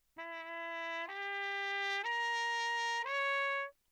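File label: trumpet mute; Ullven; Perinétventiltrompete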